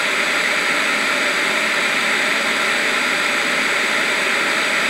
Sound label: white noise; tinnitusukshop